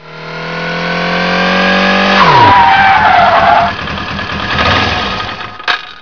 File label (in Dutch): BIKE, motoren